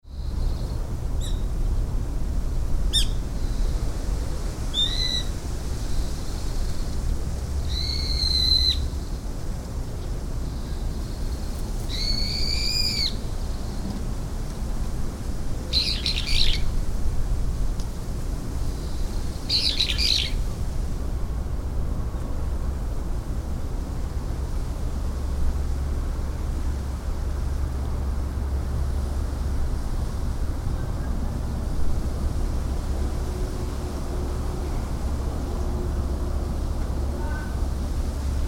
ancient tomb; Donomoto

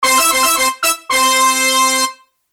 comical fanfare

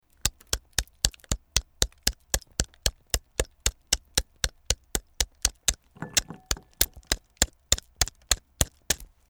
ice pick

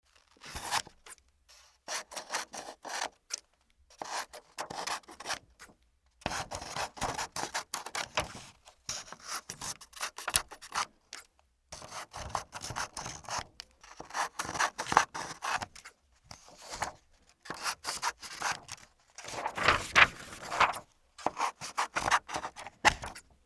scissors

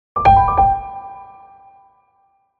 logo

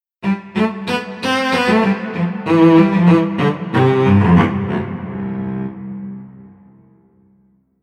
cello

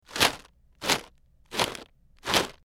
gasa

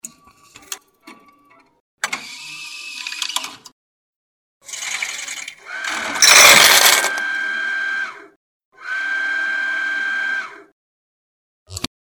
station ticket vending machine

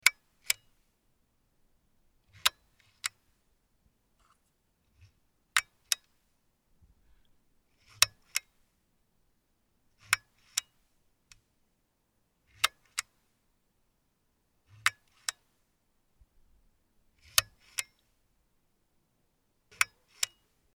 switch lamp string

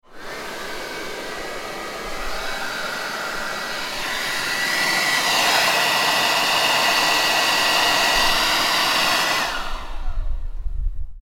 gardening blower

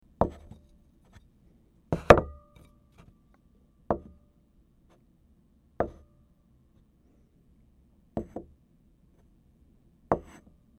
dish placing

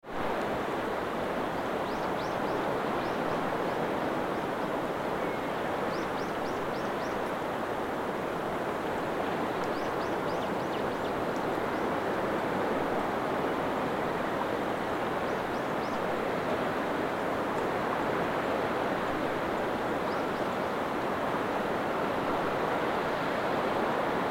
wave

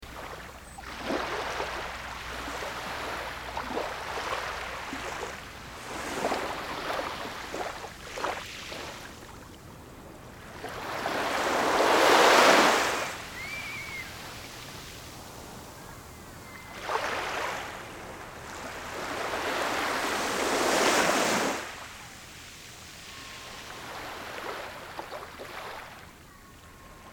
wave

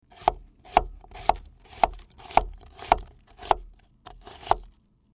onion cutting

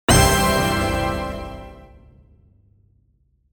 achievement

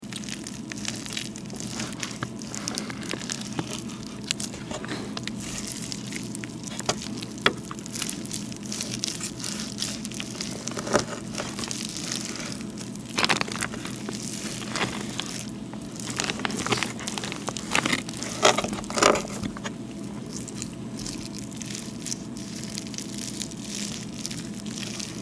cut meat

